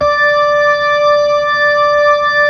B-3, Keyboards

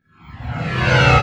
DANCE FX

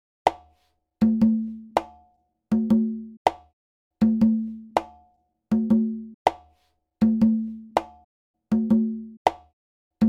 Conga, Latin Percussion

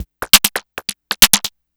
Percussive

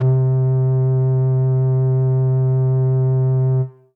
Synth C, Synth Prophet One Shots; Electronisounds

